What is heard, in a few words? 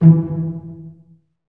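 string section, orchestra; Roland - LA Composer vol. 1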